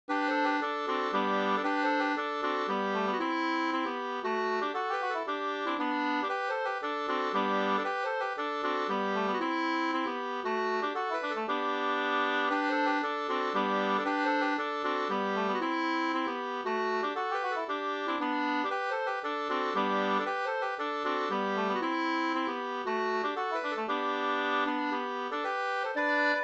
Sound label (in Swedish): hamburska; Janta och jag e Edvard Pettersson